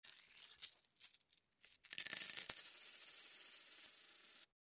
money noise

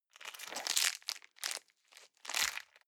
plastic bag small pocket pull out hard

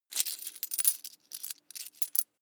coins search

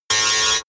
tile cutter synthetic

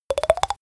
click rapid sequence up

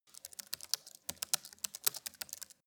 keyboard typing

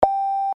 alarm

